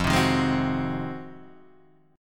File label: F Minor 7th Flat 9th; Fm7-9 Chord {1 3 1 1 1 2} strummed